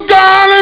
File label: 60'er cry; Amiga Soundtracker sample